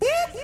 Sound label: "Uuuh"